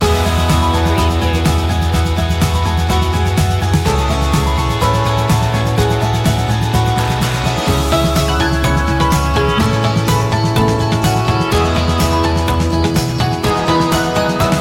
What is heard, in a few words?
the skys the limit no vocals